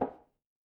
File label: impact wood knock